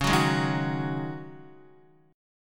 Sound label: Db Suspended 2nd Suspended 4th Db, Guitar; strummed; JGuitar